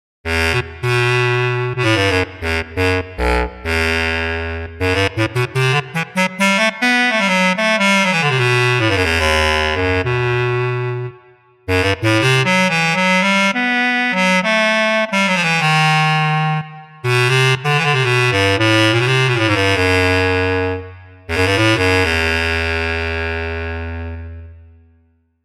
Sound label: Bass Clarinet; Kontakt Wind Paks